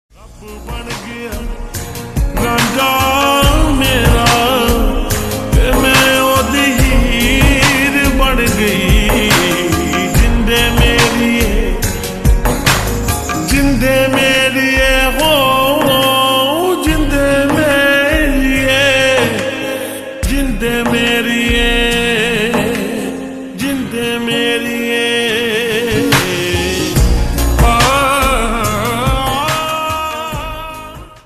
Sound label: Slowed Reverb Ringtone